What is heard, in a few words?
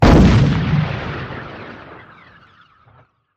big Win Explosion; shangrila